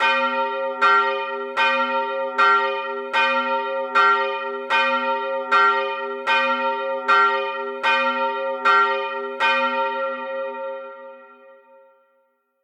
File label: Church Bell; The Town; Devour 2021 gamerip pc macos